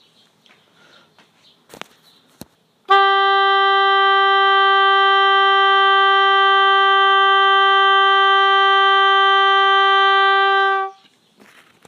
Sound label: Long Tone Legere; Loree